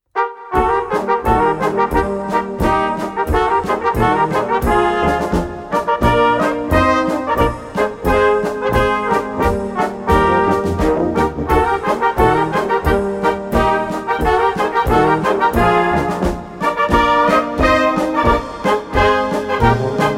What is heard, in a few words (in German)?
Glocken Boarischer